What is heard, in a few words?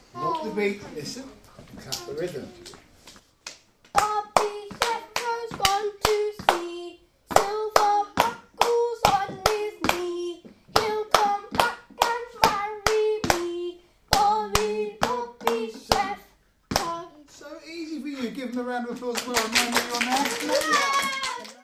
Walk Clap Solo; Bobby Shafto; Walk B Clap R